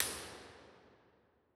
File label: Church; Real Places; Mornac; Impulse Responses; Ableton Live 12 Lite; Hybrid